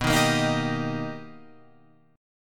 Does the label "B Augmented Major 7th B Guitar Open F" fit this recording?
Yes